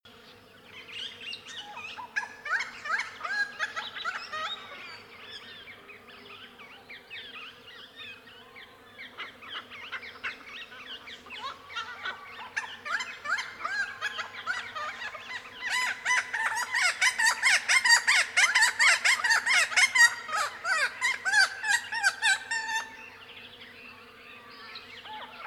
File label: Noisy Friarbird; Andrew Skeoch